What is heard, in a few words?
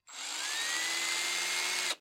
drill fast medium; SoundsCrate